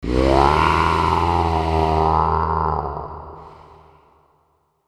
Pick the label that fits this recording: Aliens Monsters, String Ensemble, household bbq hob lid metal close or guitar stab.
Aliens Monsters